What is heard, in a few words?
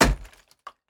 ice hit